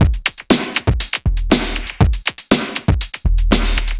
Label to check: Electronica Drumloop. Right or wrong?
Right